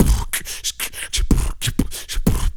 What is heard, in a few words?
lipo crunch; Spectrasonics; Planet